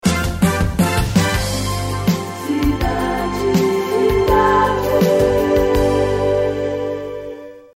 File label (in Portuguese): Vinheta; Cidade FM